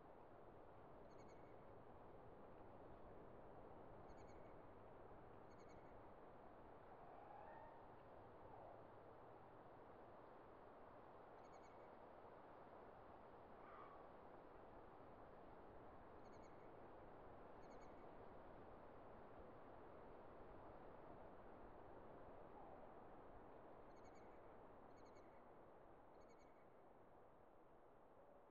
int closed room; Titanfall 2

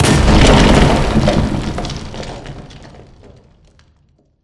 Explosion Rumble Interior Close Punch; Boomtown; Titanfall 2

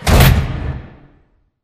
sliding door sm verb close start; beacon; Titanfall 2

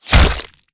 bullet generic head kill shot human; Titanfall 2

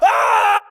grunt death crush; Titanfall 2

